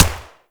Viper Fire, Weapons; Warframe; Vipers

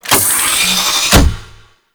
Payload Side Open; Warframe; Tenno

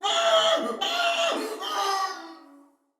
Death Fire, Soldier Male; Battle; Barks; Dialog; Sinecure Soldier Male B

